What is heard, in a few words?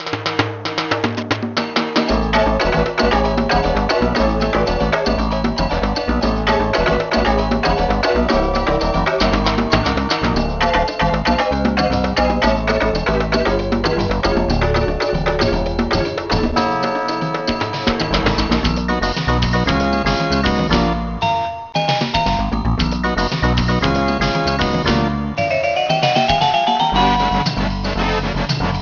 Battle; Naname de Magic; arcade